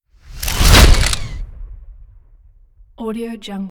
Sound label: camera surreal blasting whoosh by shutter; prosoundfx